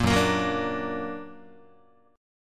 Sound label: Gb Augmented Gb, Guitar; strummed; 4A,4E,5A,5D,5G#,6C#